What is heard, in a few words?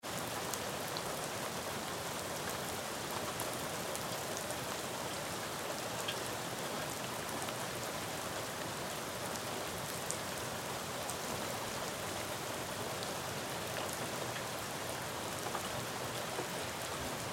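Rain Street Neighborhood Medium; Splatty; Weather; BigRoomSound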